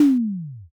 impact don

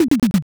drum fill

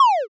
cast cancel

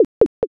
slot stop

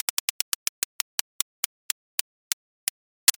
roulette

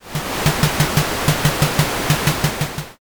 train run

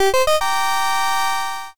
join

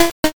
cough

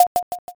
piece move

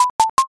chip

